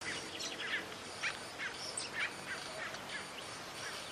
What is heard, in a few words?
Scarlet throated tanager